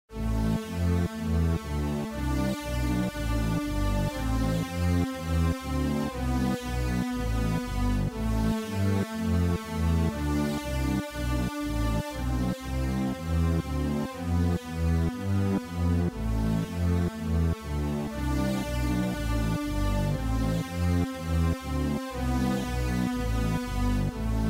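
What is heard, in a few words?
game over, BGM 暗い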